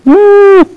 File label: Trumpet; Toxic Ravine v1.1; The Arcade